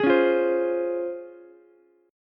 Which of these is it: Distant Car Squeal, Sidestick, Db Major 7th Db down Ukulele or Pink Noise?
Db Major 7th Db down Ukulele